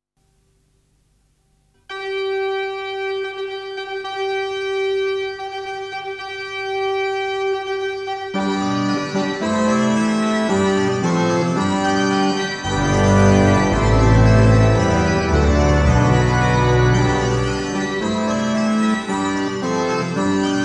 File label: Fanfare